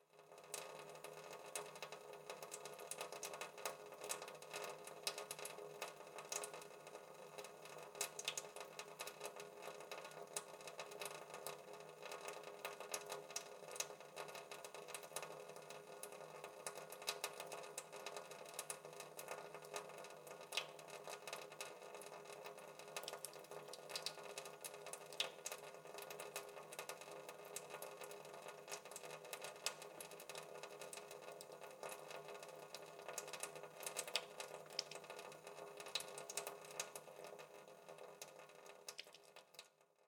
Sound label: Water Sink Leaky Faucet Drip, bathroom; Household; SFX Bible